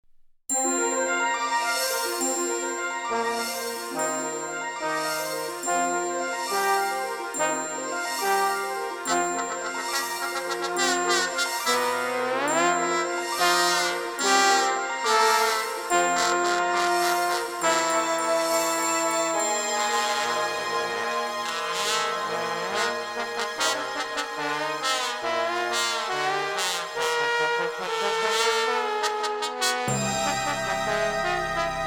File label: Trombones